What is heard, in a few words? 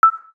star key, key tones; Samsung; GT-C3200 Monte Bar